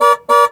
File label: Horn